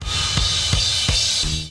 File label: drums, bateria